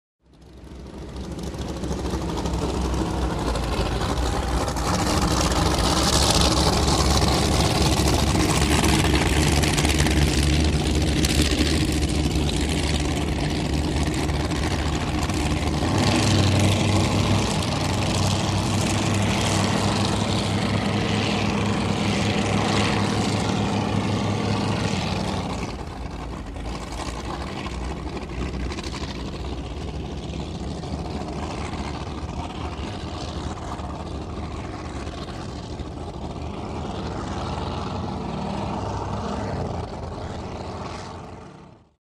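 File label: Power Boat Interior Racing, off shore power boat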